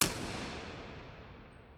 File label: convolve ir